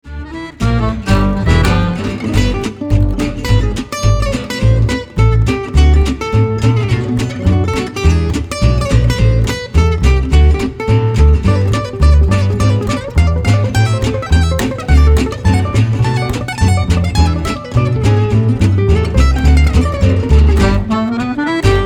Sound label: swing